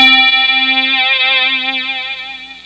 kp800 white

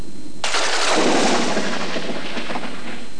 thunda; HORROR

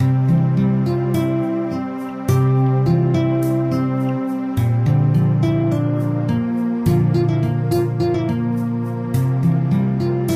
gameplay; Geschicklichkeit; AquaBubble2

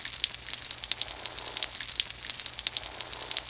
water loop; Spearhead Demo

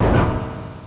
imp

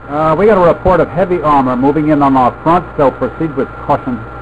Heavy Armor Buildup; M4 7.5.2; M4™ (PowerMac)

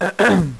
"ahem", Dealer's Speech; PowerPOKER Demo

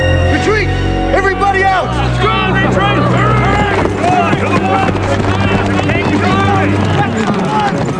exit windows; Xena

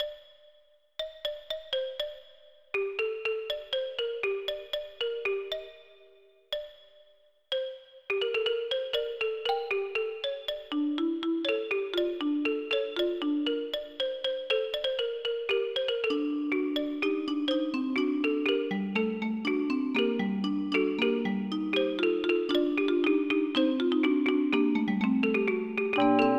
FUGUE

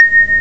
Lift Stop; Grunt

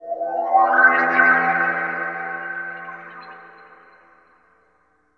ufo; DirectX SDK; DMusProd

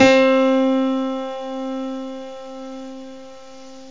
E Grand

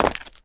Street Land, Footsteps; urbanchaos

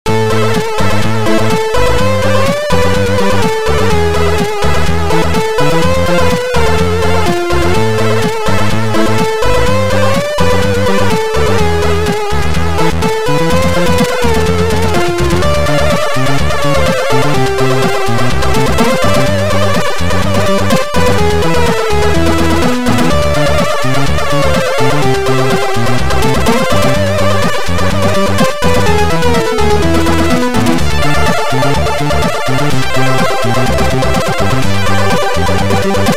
The Holy Noise

